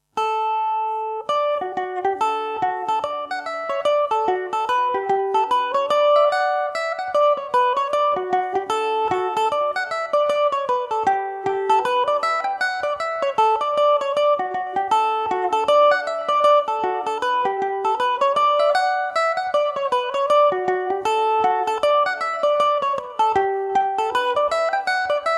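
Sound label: D major, Irish mandolin; cap and bell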